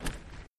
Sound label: click spin; Sheriff Of Nottingham